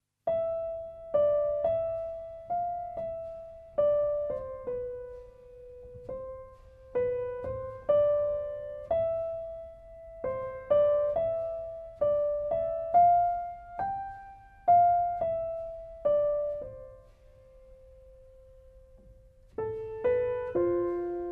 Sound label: The Piano Duet